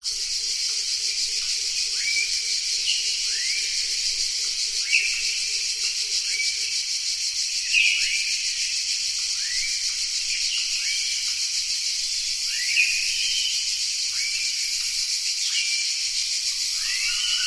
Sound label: nature rainforest ambience